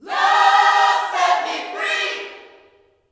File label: Vocal Gospel MULTI PHRS; LUV FREE; Spectrasonics; Vocal Planet Vol.1 Gospel